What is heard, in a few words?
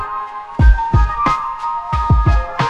Japanese flute, lofi; musicgen